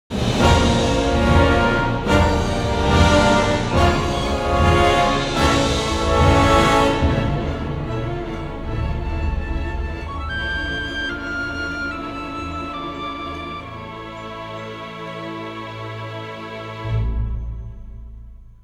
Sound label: Ramp Down, music